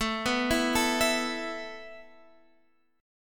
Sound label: A Suspended 2nd A Guitar Standard; Asus2; x,12,9,9,10,12; arpeggiated; capo=2